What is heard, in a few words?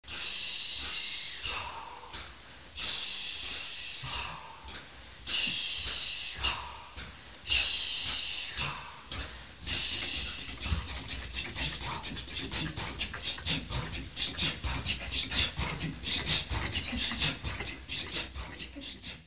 trains; Steve Block